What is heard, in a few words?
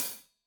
Hat Loose, Hi-Hat, Cymbals Stereo